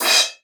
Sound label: brush; Northstar - New Gold VOL-2; 30S BRUSH